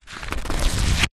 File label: household book thick paperback pages flick through fast; zapsplat